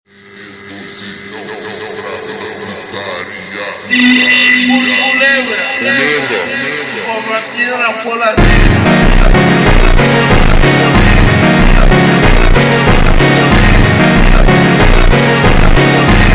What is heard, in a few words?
funk, Super Slowed, Reverb